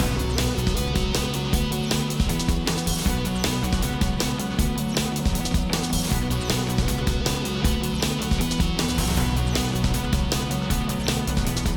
Tornado Combat MIXED; ben 10

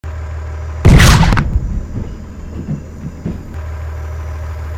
Fire; Tank Attack

